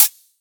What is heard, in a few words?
Hihat, Psytrance; One Shots